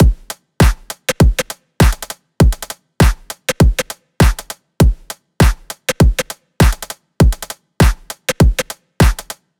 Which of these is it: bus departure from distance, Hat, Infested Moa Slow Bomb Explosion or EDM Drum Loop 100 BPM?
EDM Drum Loop 100 BPM